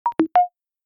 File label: cute sms